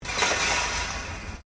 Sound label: Wire Fence Impact; Material components to create more complex sounds; Hits; Objects